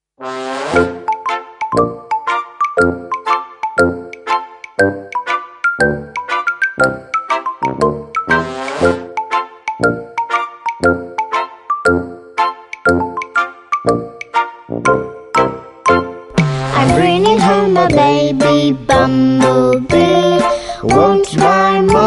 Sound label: Baby Bumblebee